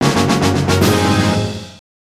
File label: Fanfare - Snag Successful; galeofdarkness